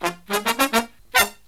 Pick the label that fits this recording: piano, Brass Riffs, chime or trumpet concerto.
Brass Riffs